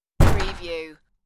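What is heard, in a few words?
Break Down Door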